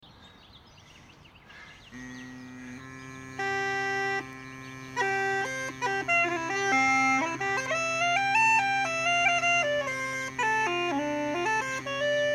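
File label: drone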